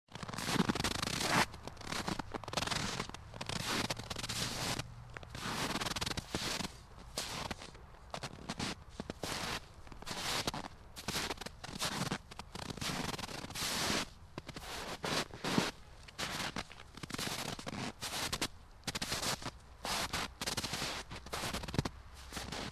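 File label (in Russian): снег под ногами, зимний лес; zimnij les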